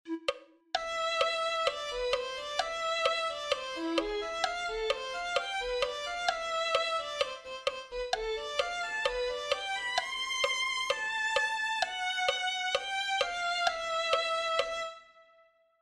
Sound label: violin 1